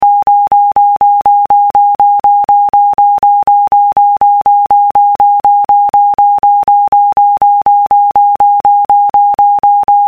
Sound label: Vibrato